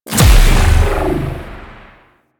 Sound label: Green Pillar Spawn; Warframe; Venus; Venus Commander Green; Abilities